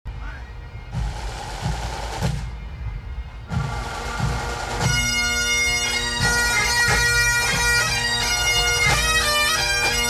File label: pipe band